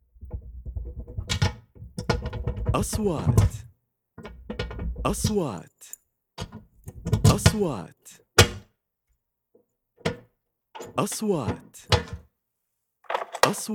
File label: locker iron metals opening the locker